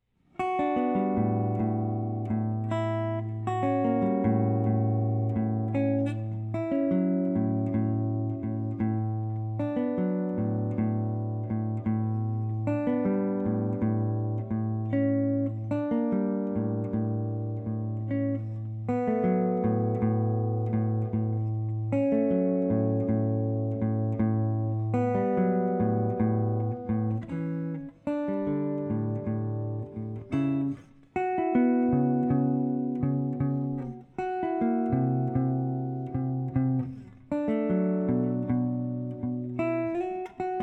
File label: Waterfall